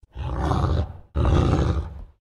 werewolf; nssm

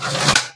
tool; techage modpack

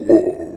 mummy hit; spawners mobs

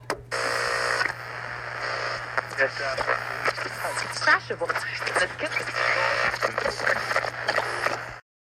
radioo; zombies4test

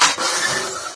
tool; techage modpack; Joachim Stolberg

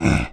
mummy hit; spawners mobs

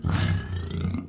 uruk hai neutral; spawners mobs

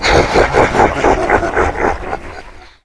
balrog neutral; spawners mobs

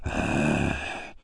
mummy neutral; spawners mobs

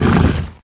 uruk hai death; spawners mobs

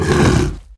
uruk hai death; spawners mobs

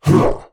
mummy attack; spawners mobs

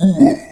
mummy hit; spawners mobs

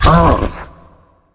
balrog hit; spawners mobs

